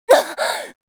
hurt; macha

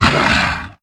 vox attack

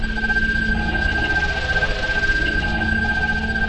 glaive flying loop

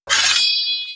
sword deploy

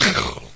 maggot melee; doom3

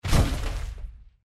walk, power armor; clothing system

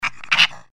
giant rat attack; npc

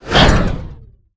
fail

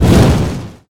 whoosh fire

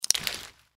mantis arm attack; npc

